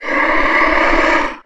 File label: moan; The Elder Scrolls III - Morrowind